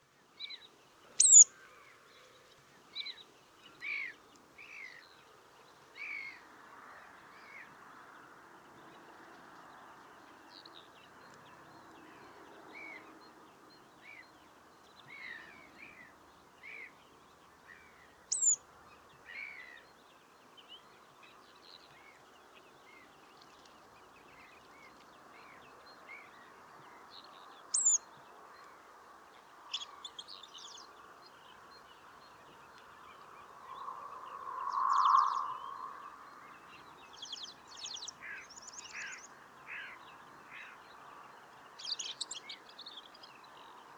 Red throated Pipit Anthus cervinus, Ladakh

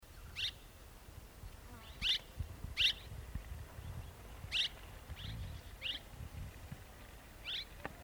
Red rumped Swallow Cecropis daurica; Ladakh

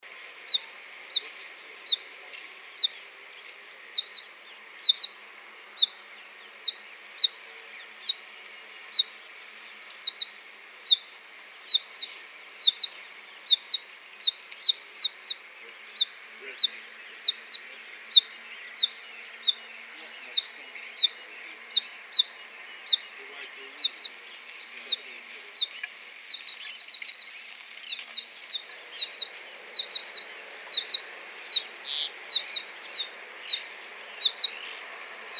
Variable Wheatear Oenanthe picata; Ladakh

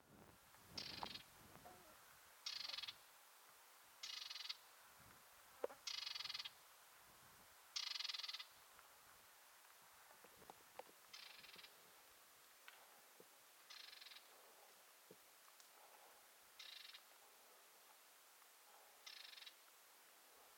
Humes Whitethroat Sylvia althaea, Ladakh

